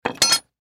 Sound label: Knife Meat Cleaver, Knife Meat Cleaver Industrial Set Down Blade Drop; Household Knife Meat Cleaver Kitchen Industrial Set Down Blade Drop; Food Prep; Blastwave